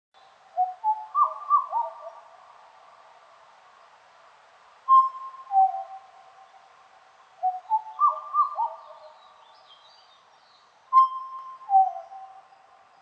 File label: black butcherbird